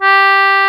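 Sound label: CONCERTINA; E-MU Formula 4000 Series Vol. 4; Earth Tones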